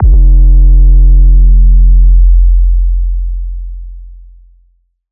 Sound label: 808 Bass Thicc F3; Slate 808 Deep Dive; SD Signature 808s